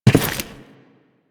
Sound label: Step Default, Footsteps